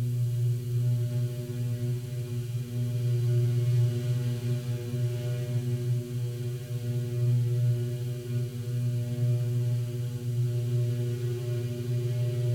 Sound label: biology, ambiances